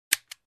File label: Gun blocking